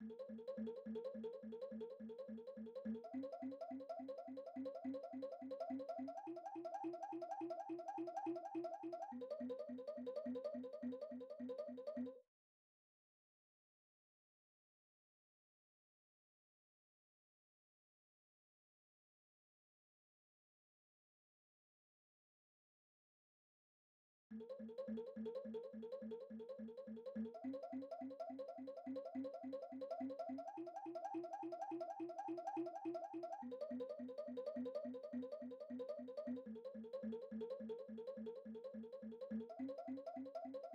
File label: 158 BPM E Min Synth, melody loop stem; Cymatics; 2022 Melody Collection; Weakend